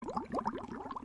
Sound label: sinking